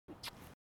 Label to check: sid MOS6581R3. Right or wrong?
Wrong